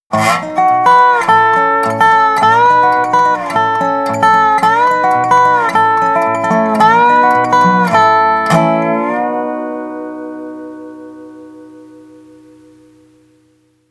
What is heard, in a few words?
Dobro FX